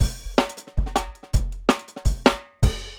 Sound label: 160 live jungle, breaks